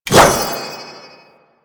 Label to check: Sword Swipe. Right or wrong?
Right